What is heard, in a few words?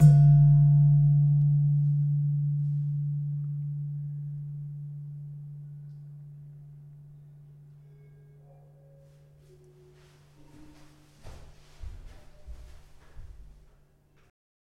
Slentem, gamelan; gravityharps; timbrebox